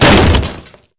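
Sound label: break wood small; Spearhead Demo; MacFormat 2003 June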